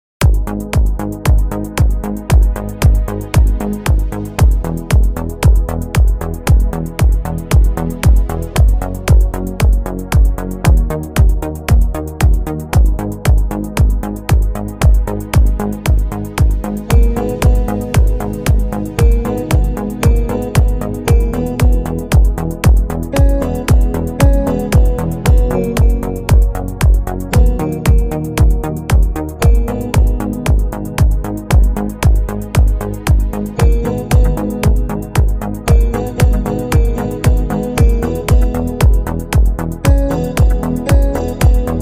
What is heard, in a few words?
italo disco; new italo disco hit 2023